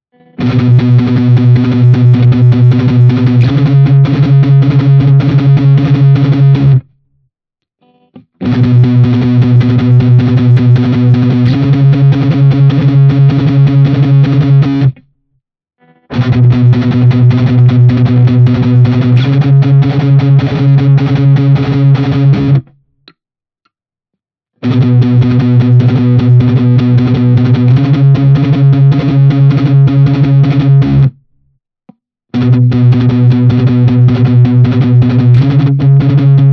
Schecter Exotic Ziricote rhythm Riff B all positions